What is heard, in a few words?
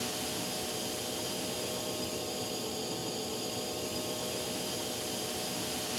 jet light; space kitty